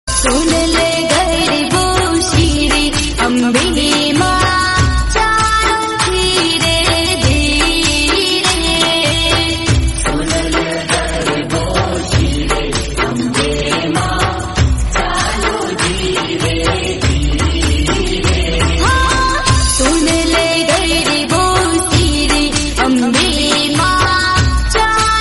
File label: gujarati garbo song